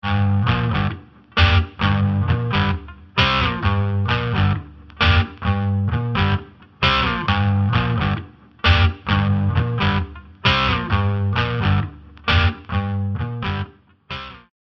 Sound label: bubbler vibes, guitar dry